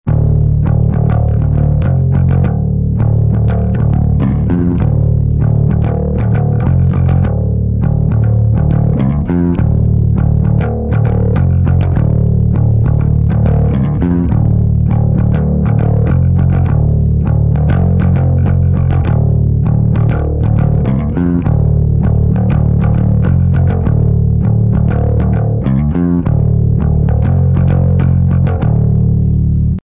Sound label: bass amuser mid gain